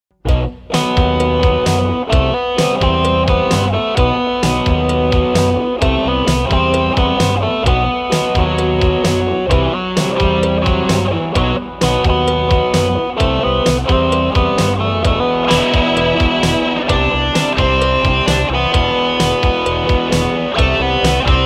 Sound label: bass practice; weeklybeats 2024